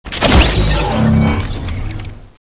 death sound; cstrike; pubg

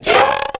zombie death; cstrike; jailbreak

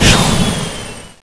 booster, kart; cstrike

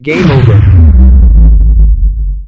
win minigame; cstrike; jailbreak

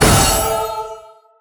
buy sound; cstrike; pubg

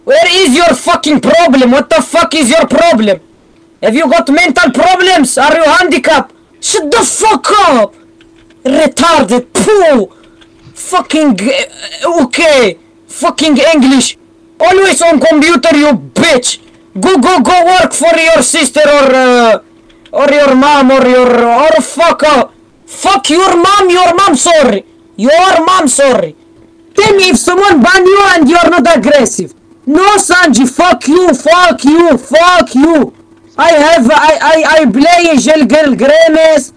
item boombox; cstrike; jailbreak; sanji fix

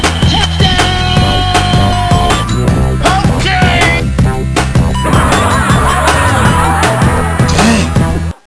cheer; cstrike; kickball

